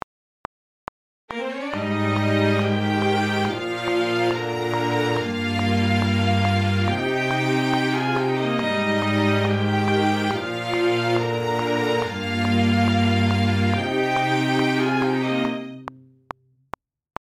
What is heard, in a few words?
Strings